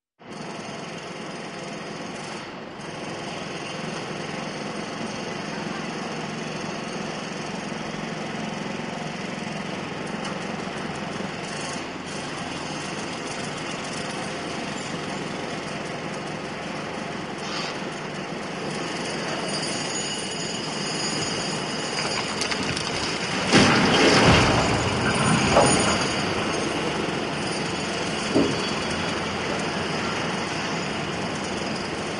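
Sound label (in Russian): разрушение стены; Звуки строительных работ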